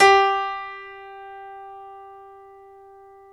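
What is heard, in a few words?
piano, Grand piano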